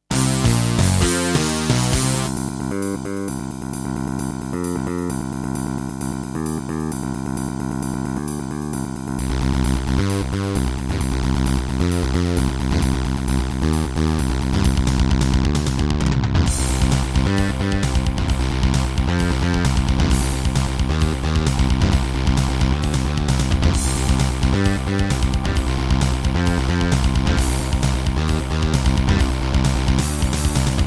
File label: Terratec MiniWaveSystem SOWT-11; sigil doom episode 5